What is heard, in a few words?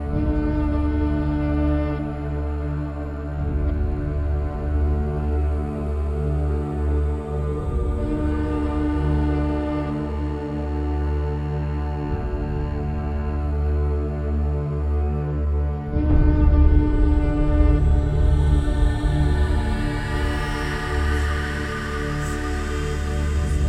Bridge Start Music